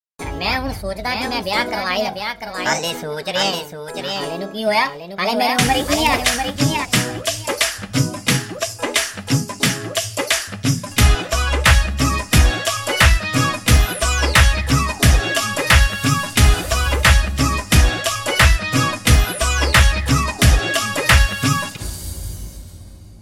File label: Instrumental